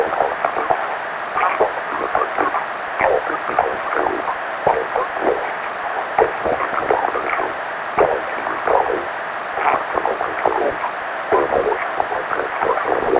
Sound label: combine radio idle; soldier common